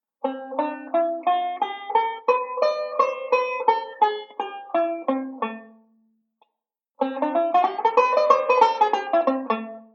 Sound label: Modal Sequence; Beyond Chord Melody Volume II